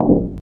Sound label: glass footsteps, infected walk